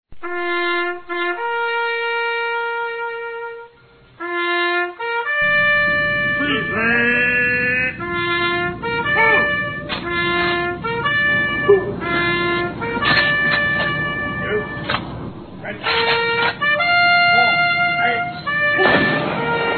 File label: Bugle Call Taps With 21 Gun Salute; armi